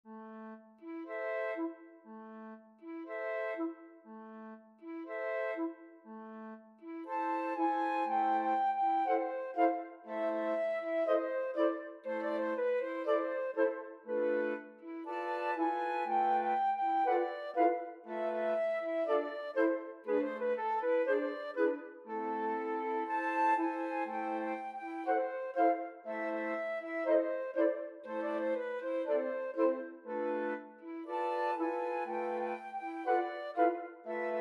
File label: habanera, flute choir